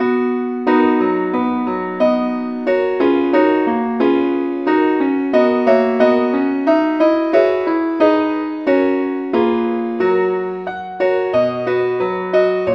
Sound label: keyboard only